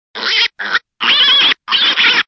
Wild Piglet Distress

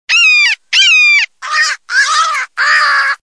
Crow Death with Hawk

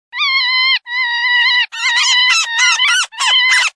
Woodpecker Buffet

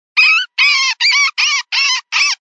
Distressed Rodents

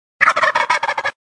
Gobble

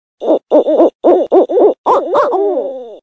Barred Owl, predator calls

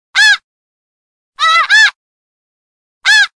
Single Snow Goose

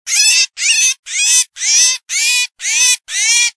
Starling Distress, predator calls

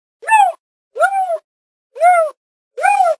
Bobcat in Heat

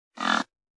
Buck Grunt

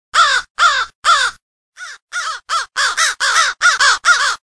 Crow Come Here

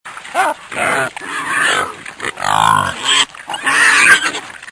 Feral Hog Frenzy

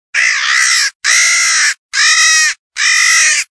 Death Cry of a Crow

